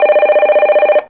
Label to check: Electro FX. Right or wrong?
Right